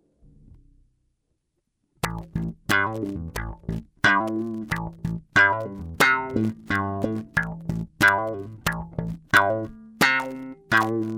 wah; Snarling

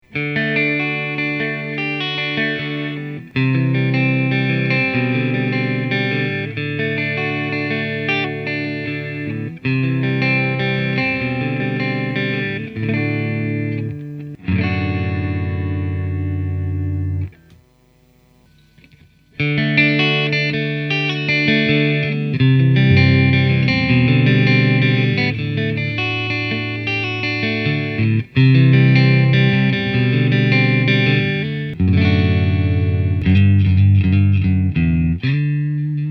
Test Sonic Stomp; BBE

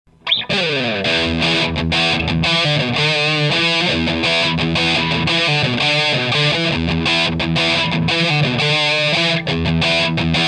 Metal End

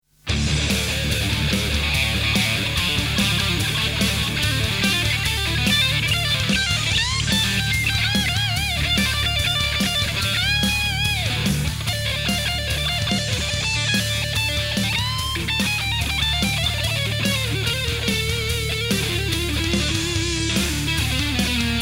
Fernandes Monterrey Elite (dist)

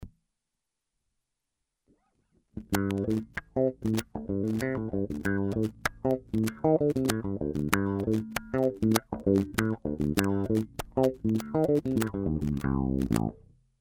wah; Snarling

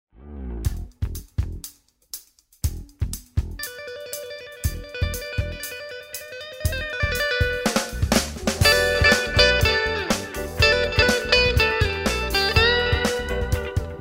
Fender Super Champ

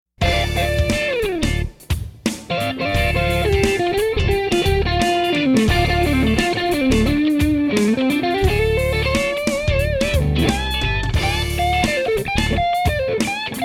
Biyang TL072 OD-8

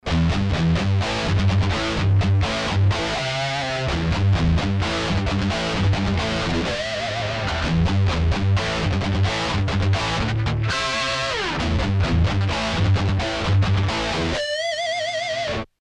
metal; fender

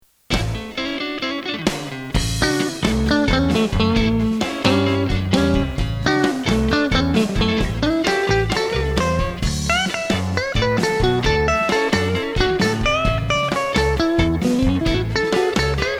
Strat; Fender G-DEC 3 Thirty; Nawlins

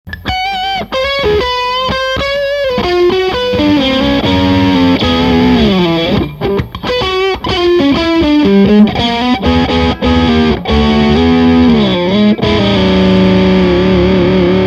Godin Dist Solo

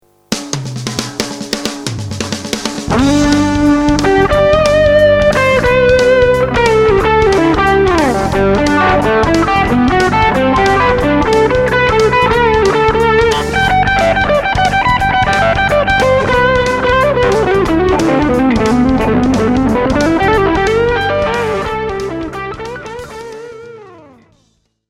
Fender G-DEC 3 Thirty

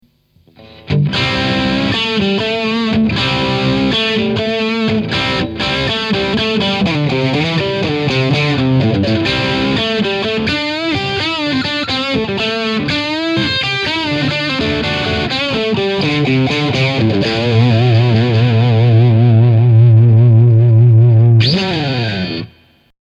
Morley Kiko Dist

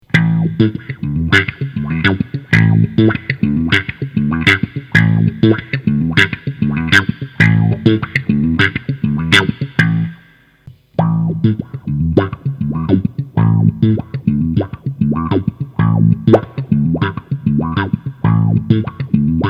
Fonk Wah; TM Stevens

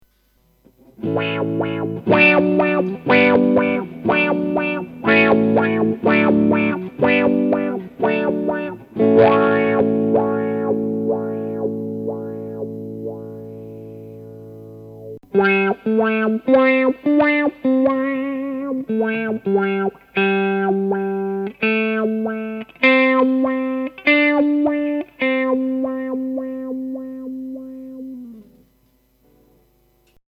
Morley Kiko Wah

